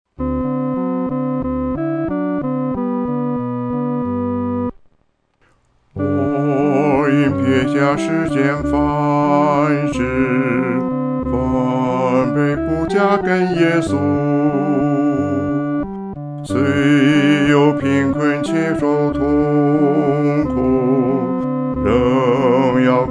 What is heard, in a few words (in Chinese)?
独唱 第四声